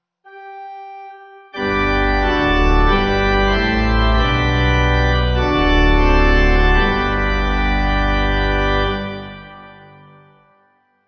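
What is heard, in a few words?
Morning Service End Gospel Pipe organ, Lutheran; Lutheran - 1941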